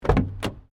WRECK CAR SMALL DOOR OPEN; Wrecked Cars, impacts, crashes, scrapes; Objects; Foundation4

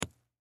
Bullet Shell; Bullet Shell 45 Drop Sand; Drops (Sound Effects:Objects); Blastwave; Warfare

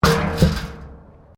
METAL BIN IMPACT WIRE FENCE; Metal (Sound Effects:Materials)

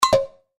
Chime Percussion

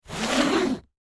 Carpet Cut Razor, Carpet Cut Razor Knife Blade; Factory; Industry; Factories; Sound Effects:Backgrounds; Blastwave

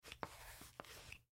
Deodorant Apply, Deodorant Apply Underarm; Primping; Household; Blastwave

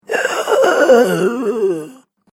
Zombie Decomposing; horror; zomby; Zomby Sound Effects

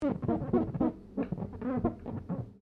GLASS SQUEAK FINGER SEQUENCE; Material components for constructing more complex sounds

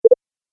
Deactivate Button; Button Sound Effects

